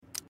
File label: menu anklicken; life is strange